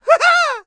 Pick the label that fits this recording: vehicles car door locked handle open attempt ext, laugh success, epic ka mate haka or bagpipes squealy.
laugh success